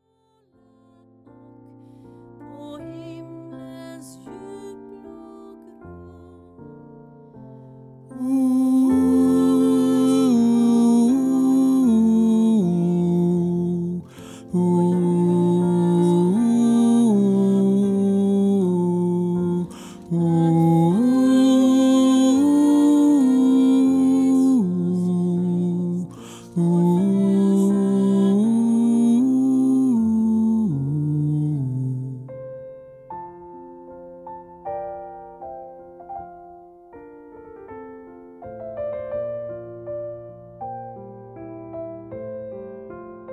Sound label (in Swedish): tenor